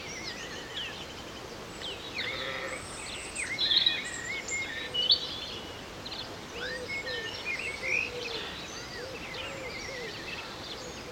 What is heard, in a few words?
morning in a village; OpenValley